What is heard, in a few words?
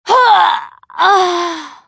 female pain death; firefight